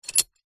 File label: horse saddle horse stirrup iron metal foot in movement exaggerated; animals